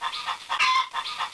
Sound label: hens